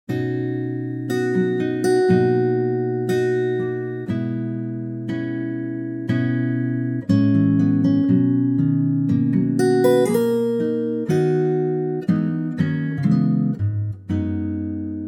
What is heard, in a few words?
tomorrow acoustic guitar B